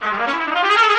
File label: trumpet danger; music